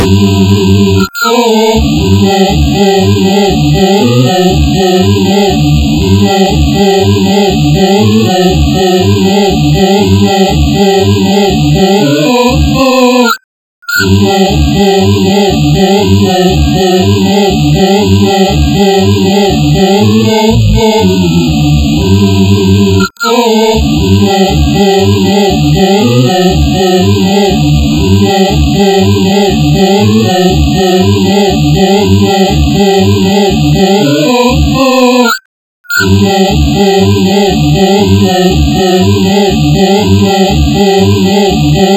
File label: Hmm Hmm